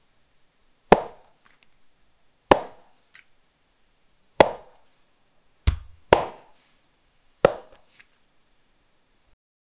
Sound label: hitting the opponents, boxing